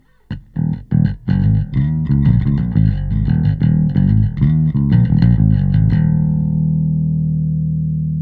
bass; Bus Processor

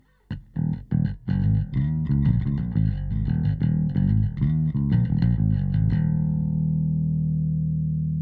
Compressor bass Bass

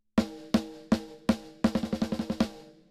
Compressor snare Rock Snare

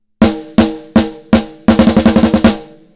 Snare

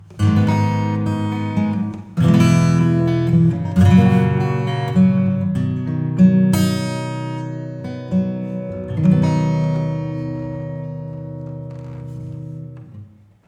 acoustic Clean Chamber

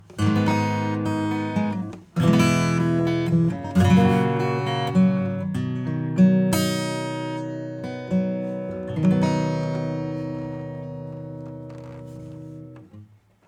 acoustic bypass; pure reverb

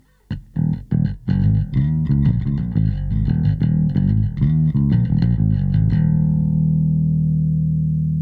bass Bass DI Confidence and Power; AMEK

